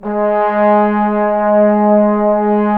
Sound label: BRS TBNS, Bone Sec.; Roland - Brass, Strings, Hits and Combos; Bone Sec.3